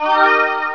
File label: heal